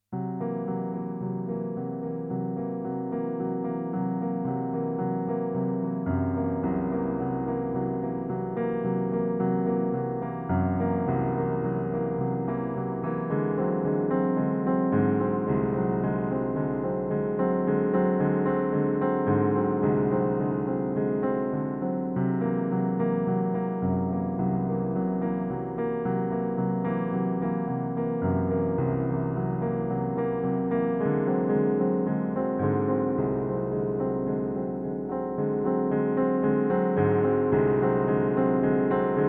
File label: karaoke